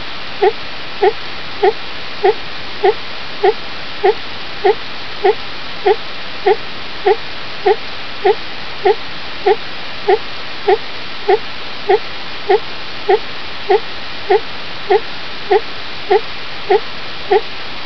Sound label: truck toy receiver